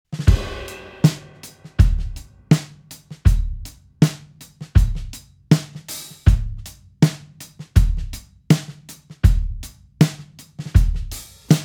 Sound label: slow groove, drumtracks